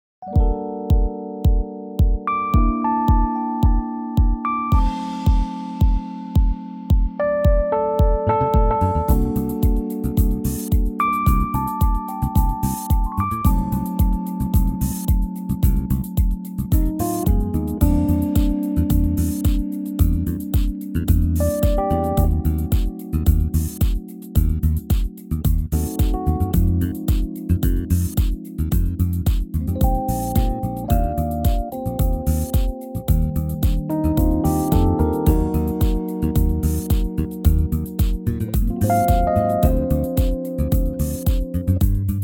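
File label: Bb smooth, backing track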